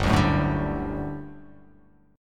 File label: G Major 7th Suspended 2nd G down